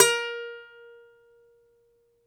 DOMRA, Russian Strings

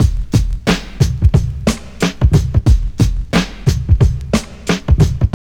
TRIP 90, trip loop

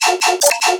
synth loop weirdo 150; Virtual Riot

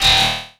Trap Fx; Sonic Foundry (Sony Creative Software); Esoterik Beatz

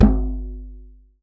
djembe; Voice Crystal - Steve Reid Definitive Percussion

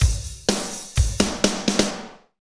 Medium Tekno, Drumscapes Roland

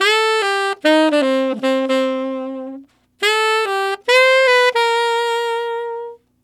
Tenor Shuffle in C7, Tenor Sax Loops, Jazz; Sonic Foundry (Sony Creative Software); Jazz Solos and Sections

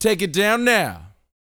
VOX, R&B Dance, HIP-HOP; Spectrasonics Vocal Planet

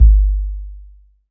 Sub, Akai MPC500 Hip Hop Kits

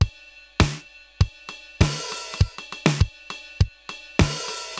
RX17 (100BPM), cyborg beats; musicradar

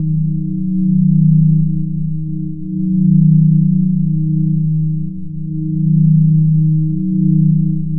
Drone Ambient; Drums & Drones

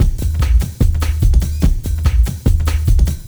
JUNGLE SLO L, 143-170 BPM; Spectrasonic Distorted Reality 1

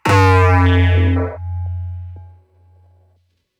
space

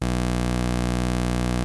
Prophet 5 B2, Synthesizer; Hollywood Edge - Giorgio Moroder Rare Synthesizer Collection

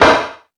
Lazer Drums, E-MU Pro-Cussion; drum machine

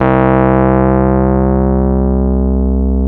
Electric Pianos; Wizoo Electric Pianos

